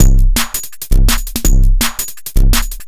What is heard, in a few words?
Beat, Futurist Drum & Bass; Drums & Percussion